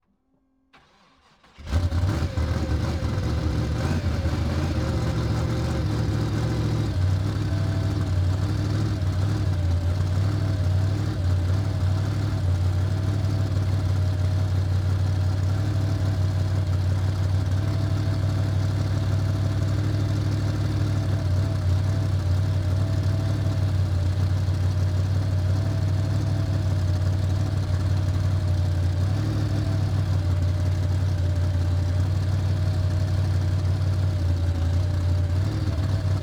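Drag Car Volvo 142 Onboard Start Medium Drive Stop Off Engine Center; MKH8020; Pole Position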